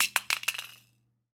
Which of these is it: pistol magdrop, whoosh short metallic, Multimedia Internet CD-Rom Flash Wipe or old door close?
pistol magdrop